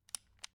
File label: 18v cordless drill switch; Switch on